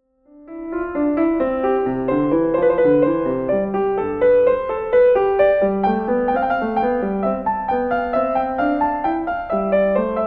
Invention No. 1 in C Major; BWV 772

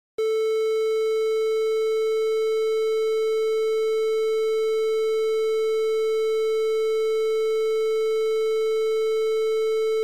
Tuning Tone

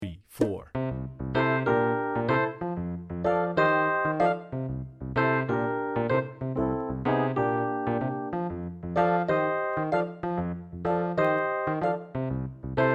Cool Blues; piano methods

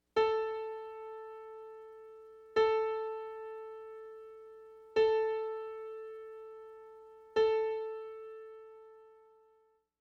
Tuning

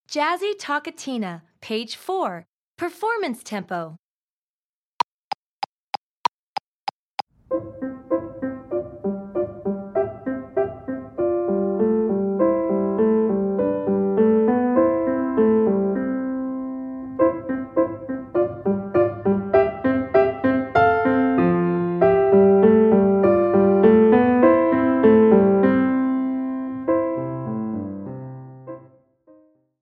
Jazzy Toccatina (Performance Tempo)

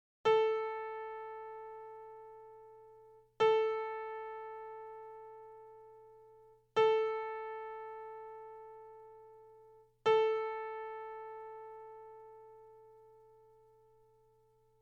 Tuning Note A 440

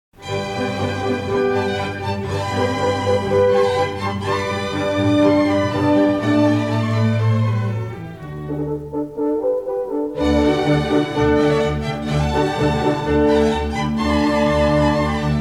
Concerto for 2 Flutes in G Major

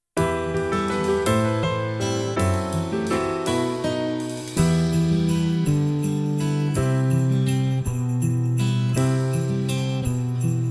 Winter Bells (Accompaniment)

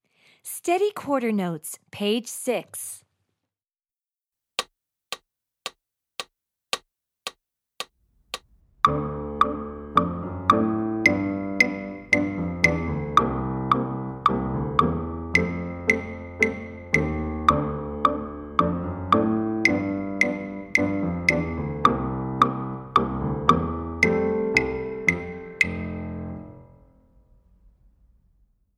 Steady Quarter Notes

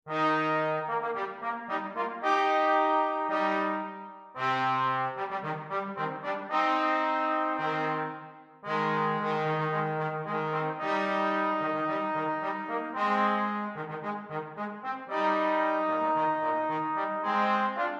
Fanfare

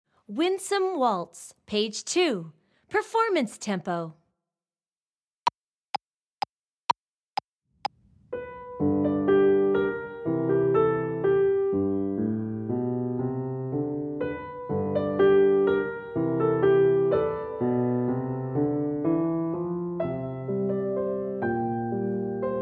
Winsome Waltz - Performance Tempo

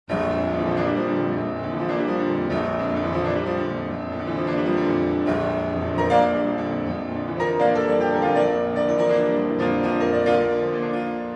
Prelude In B Flat Minor; sergei rachmaninoff

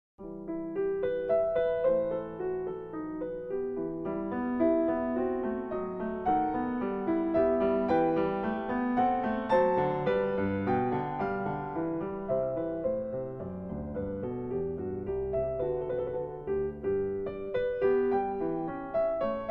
Prelude in E Minor; BWV 941

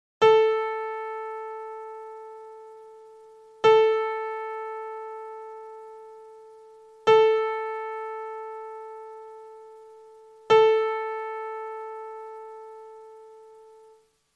Tuning Pitch A

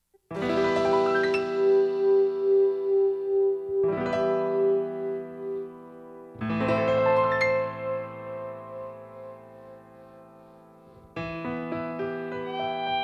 Accompaniment

